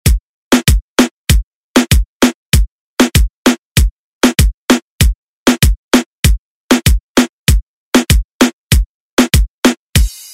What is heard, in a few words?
Latin Urban Redrum; Deejay Hit; remixplanet